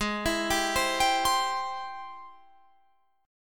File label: Ab Augmented Major 7th Ab Guitar Drop D